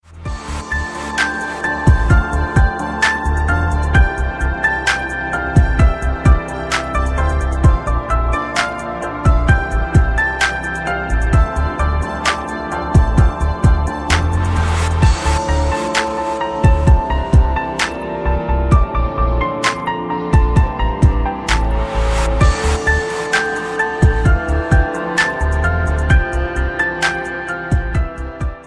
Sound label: Piano Tone